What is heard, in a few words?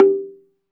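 SLITDRM G4 F, slit drum; SLIT DRM1; Heart of Africa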